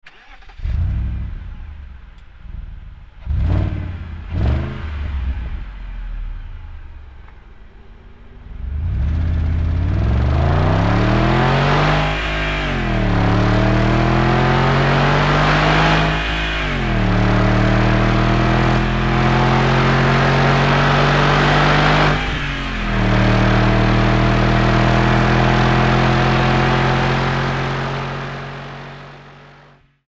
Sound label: guess what engine you hear